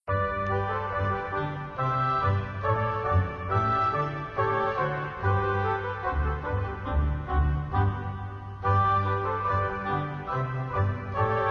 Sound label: Gavotte, PIANO